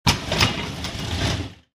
roll up door open

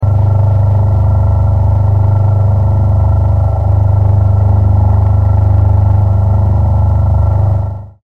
big motor

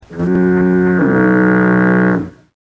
foghorn

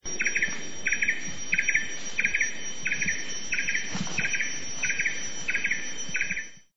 blue eared barbet

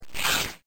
rip

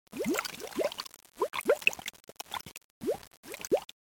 underwater bubble

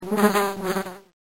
bee

